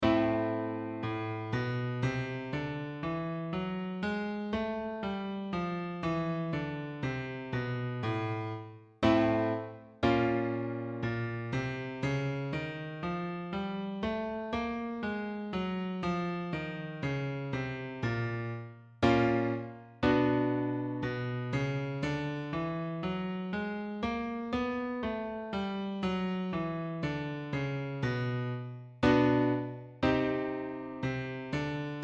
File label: Melodic Minor, Minor Scales